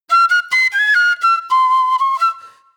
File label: Eastern Flute; Sonic Foundry (Sony Creative Software); Manhattan Flutes; Garage Funk